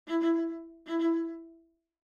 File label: Viola; Planned ending for collab quartet